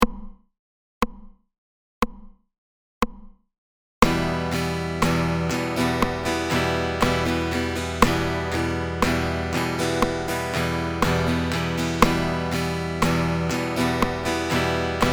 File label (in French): Rythme rapide bas et haut 60